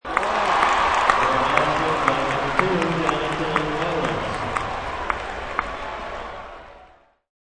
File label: Arena crowd applauses; Arenas (Sound Effects:Backgrounds)